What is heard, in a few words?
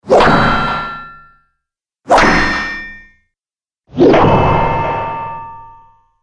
Circus Fairground Hammer Hits Collection